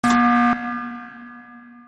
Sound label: emergency meeting buzzer